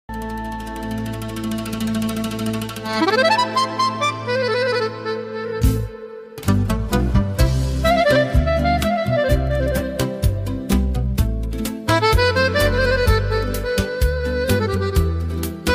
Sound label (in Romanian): acordeon